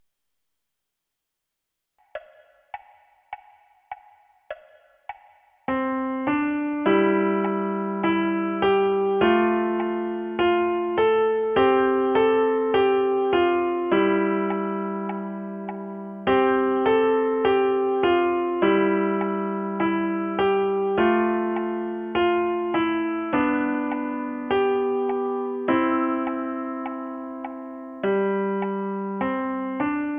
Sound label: piano